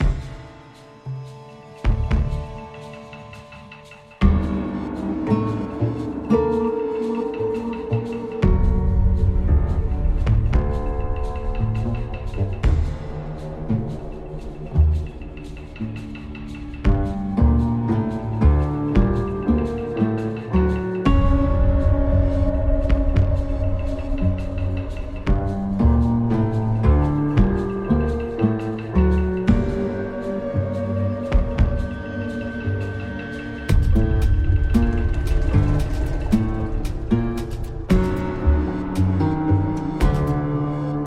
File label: School Suspense; Hello Neighbor 2